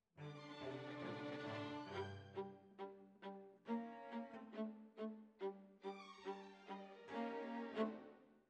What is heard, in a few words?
Violas, jazz for piano